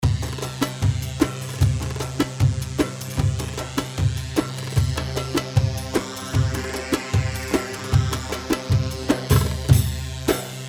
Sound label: Maksum 76, Darbakati, Sharqi; مقسوم; شرقي